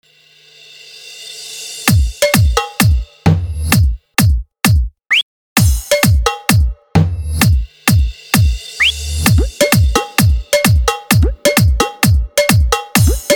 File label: Testing Beet Mid Bass Vibration